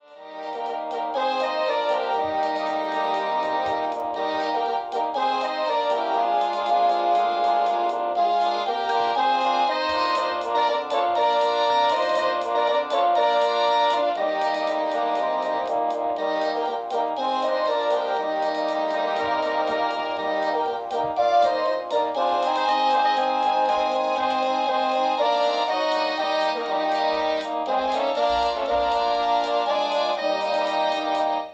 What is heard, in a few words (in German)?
Marsch